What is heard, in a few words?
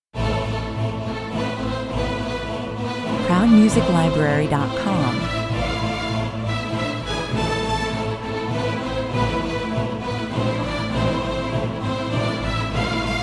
gothic 100 bpm